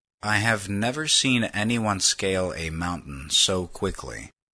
scale

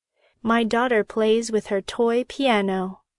piano; My daughter plays with her toy piano.

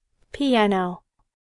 piano; a large musical instrument with a keyboard that makes sounds

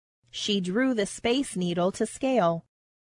scale

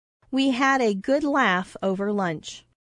laugh; We had a good laugh over lunch.

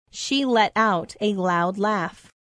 laugh; She let out a loud laugh.

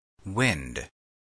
wind; air that moves quickly

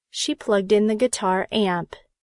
guitar; She plugged in the guitar amp.

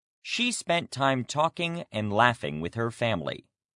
laugh; She spent time talking and laughing with her family.

